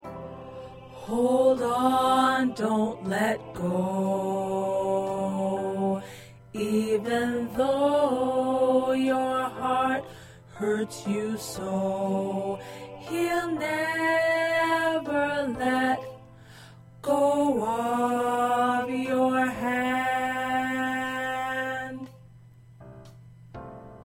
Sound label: Tenor, choir